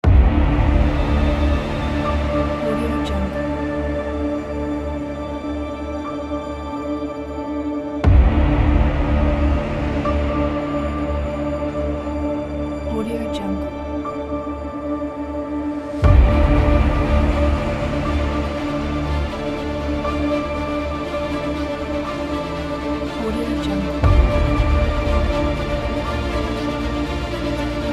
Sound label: cinematic trailer